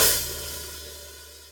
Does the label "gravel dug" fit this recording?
No